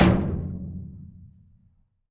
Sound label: Dumpster Hit